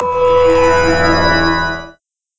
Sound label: MELODY